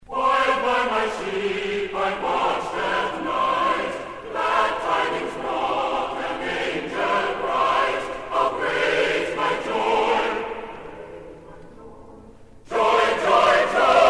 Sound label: christmas hymn